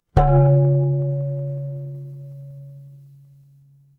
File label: instrument bell